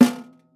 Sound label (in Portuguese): Caixa De Bateria